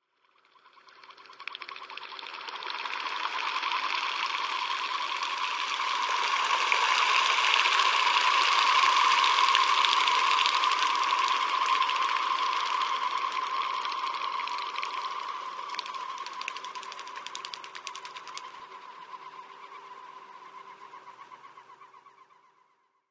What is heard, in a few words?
Surf Scoter